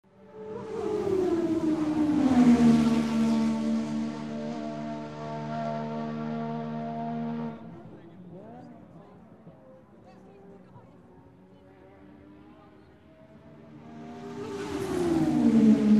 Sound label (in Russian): finishnaja prjamaja formuly 1